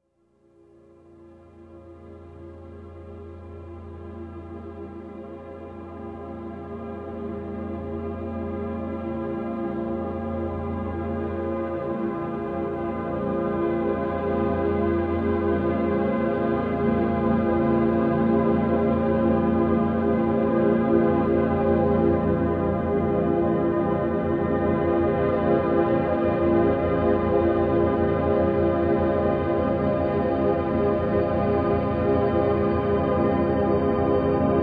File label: Total Relaxation